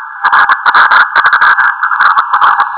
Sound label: morse